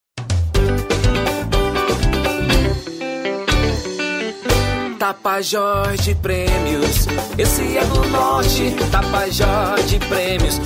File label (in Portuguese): jingle; tapajos de premios